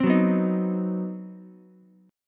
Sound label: C Minor Major 7th Flat 5th chord, Ukulele; strummed; JGuitar